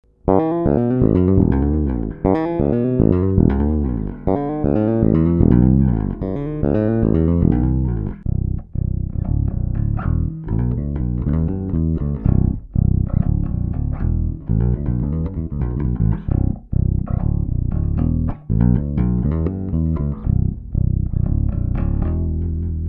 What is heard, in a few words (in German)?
Yamaha steg neutral; Bugera